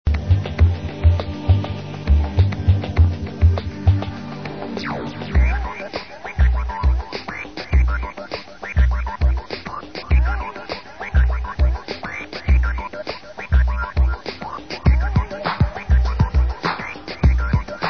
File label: TranceRitms